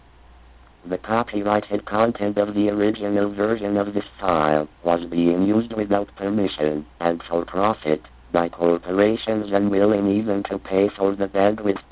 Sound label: Bass